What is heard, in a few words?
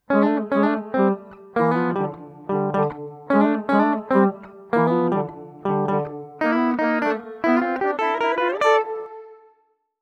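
G Mixolydian Guitar Lower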